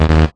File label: error